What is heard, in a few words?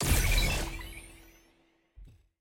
button click; lobby; strawberry; champselect